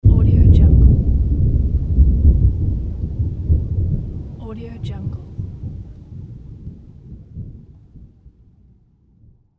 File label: bass rumble; prowaveaudio